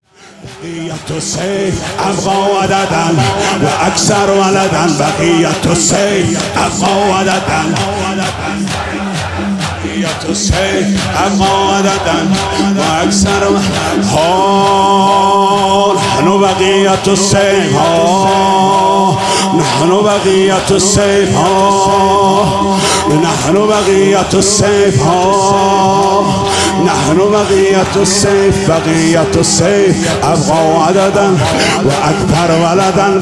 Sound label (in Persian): مداحی شور